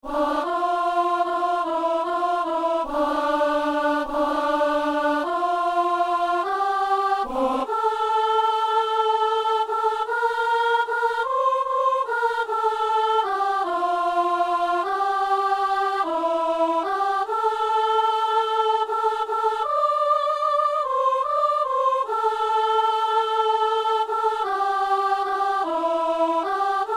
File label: soprano